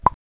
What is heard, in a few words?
pop